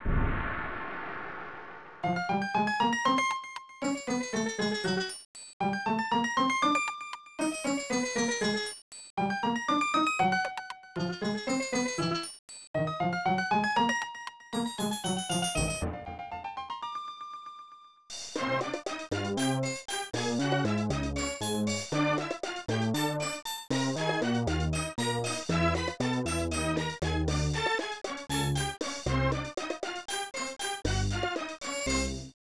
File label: Sneaky Trumpets; tick; snes